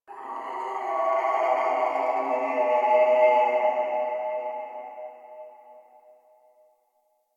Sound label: Covered Vine Area Tube Scream; Ambience; Misc Outdoor; Warframe; Infested Eris